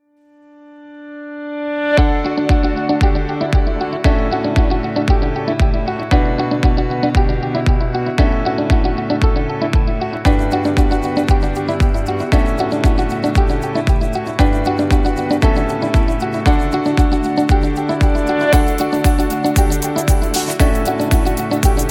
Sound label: The Positive Atmosphere, corporate music; corporate music vol 19